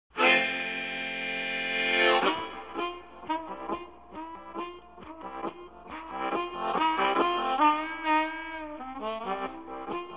Harp

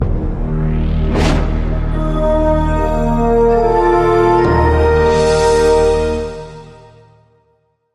Sonic Movie Logo

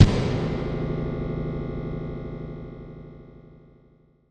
Hard Impact Tone Ring Sweep

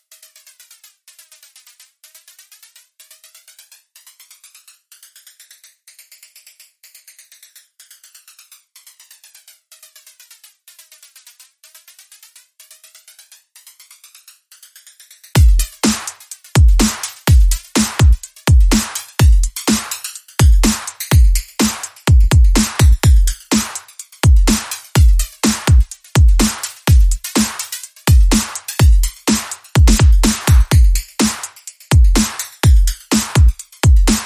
Beats Only

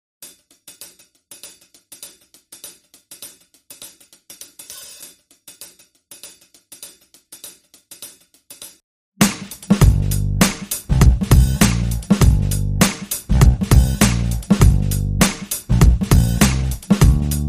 Drums and Bass

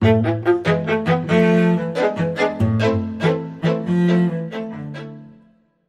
Spiccato, Sting

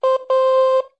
Soft Beep

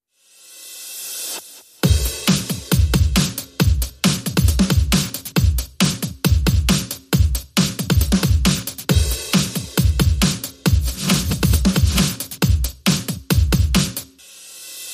Drums Only

